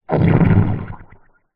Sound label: human stomach gurgle exagerated